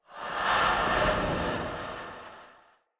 Staff Caster Charge Heavy, Weapons; Player; Staves; Galene

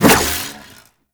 Staff Swing; Warframe; Duviri; Dax; Weapons

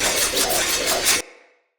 Saw Dagger Throw Loop; Weapons; Player; Swords

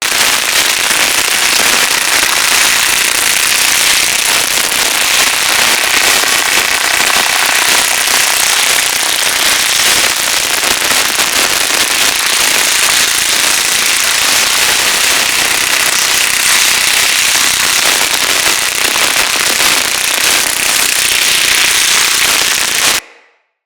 Beam Impact Flesh Loop; Weapons; Bullet Impacts

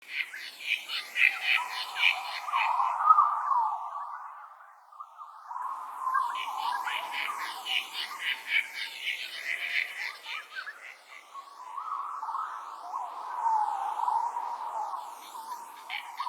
Critter Loop, Root Cave Ambience; Neathuns

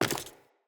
Wood Step; Sprout Farmer

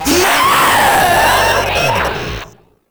Proto Infested Runner Vocal Attack; Warframe

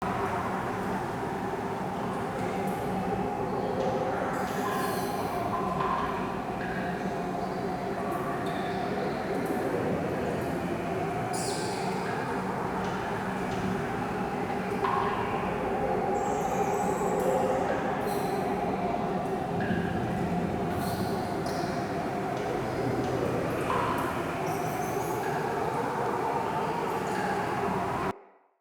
Root Cave Bed Loop, Ambience; Neathuns Root Cave

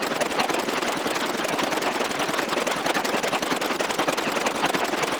Vay Hek Propaganda Drone Loop; Warframe

